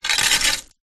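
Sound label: Metal Scrape Rock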